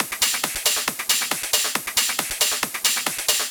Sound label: 137BPM Loop, Drums & Perc; Amajor